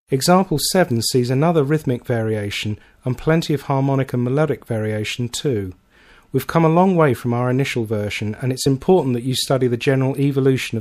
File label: Rhythmic Performance Version, Guitar Method